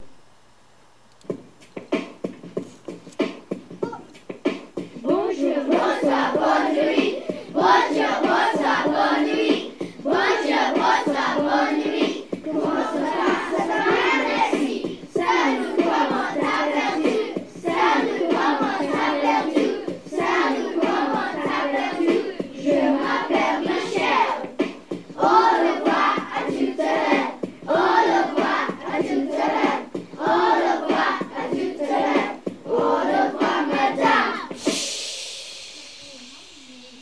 greetings rap

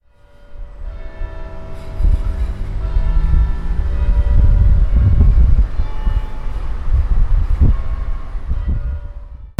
bells

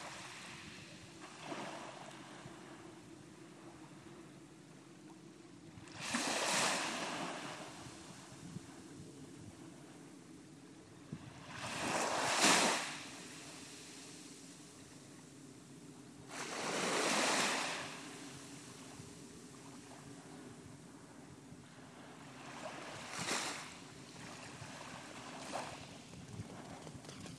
flat calm sea with waves lapping gently

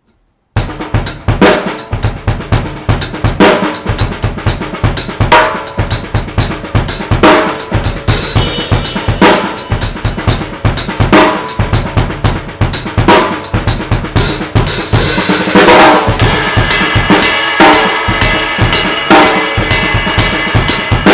latin drumbeat

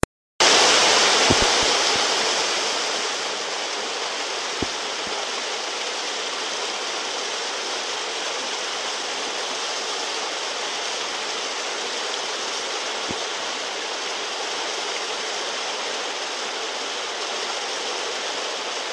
sound of water running; pagyesa daegu

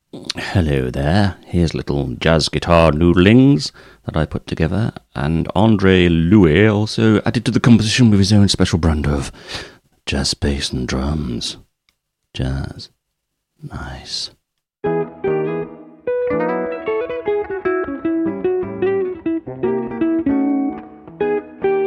original jazz guitar tune

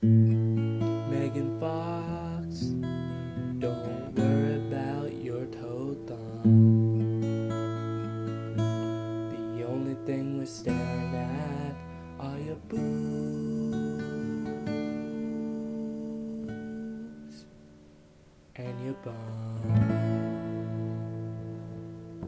song

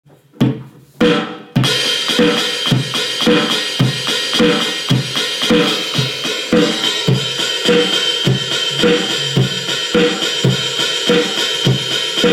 drum kit piece

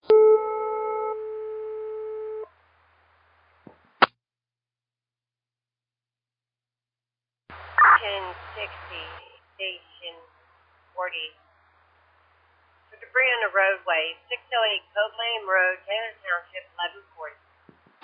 page received; roaring spring station 60

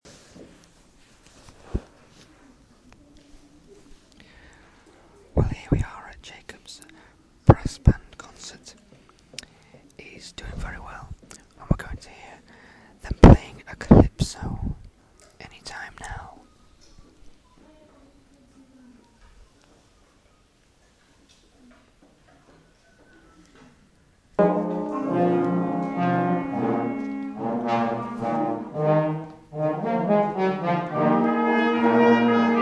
brass concert

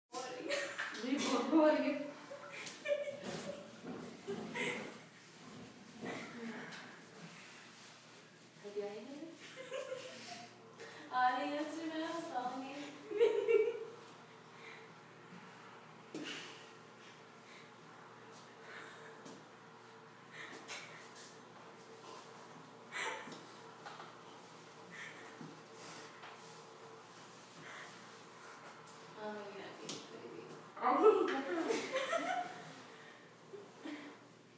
disco

